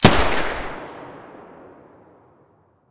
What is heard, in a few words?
muzzle ump45 mono, weapons